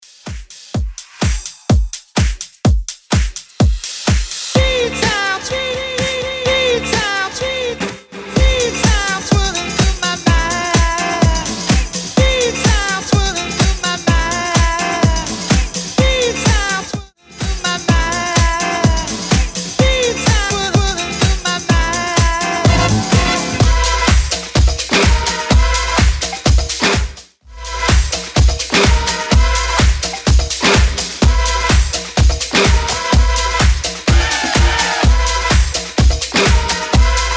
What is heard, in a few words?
90s 126bpm